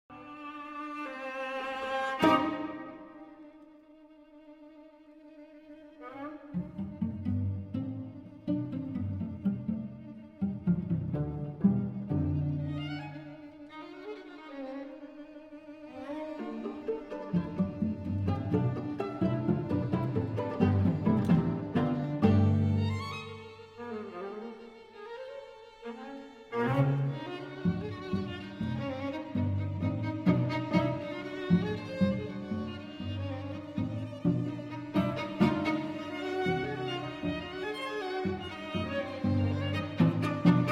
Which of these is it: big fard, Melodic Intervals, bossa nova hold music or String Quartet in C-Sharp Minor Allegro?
String Quartet in C-Sharp Minor Allegro